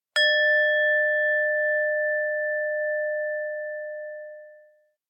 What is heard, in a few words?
rin chime; anemoi